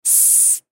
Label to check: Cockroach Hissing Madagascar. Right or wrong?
Right